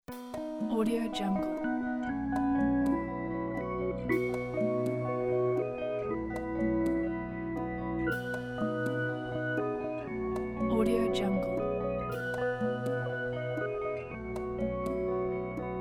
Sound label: calm; dot; yorktrax